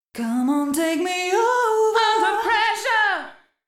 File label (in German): Hall